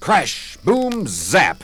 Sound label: crash boom zap, Voice; ooh ahh